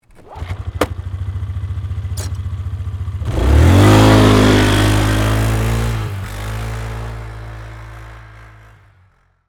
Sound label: ATV Away, Sports, Atv, Start, Up, Pull, Away, Polaris, 500Cc, Four, Wheeler